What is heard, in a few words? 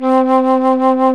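Alto Flute vibrato; FLT; A.Flt vib 2; Roland L-CDX-03 Disk 1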